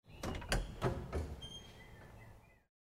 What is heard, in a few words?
Foley